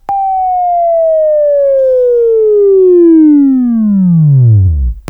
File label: Buzz; Labview-Program; Sweeping Training program